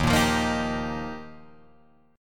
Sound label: Eb Major Add 9th, down Guitar Drop D